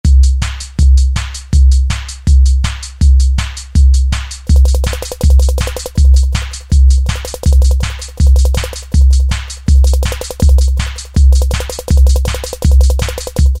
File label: Press Roll, Alesis QS8; Factory Preset examples